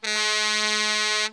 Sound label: emergency